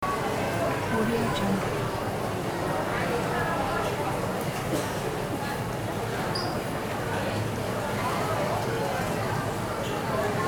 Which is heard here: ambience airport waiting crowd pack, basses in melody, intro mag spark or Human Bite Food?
ambience airport waiting crowd pack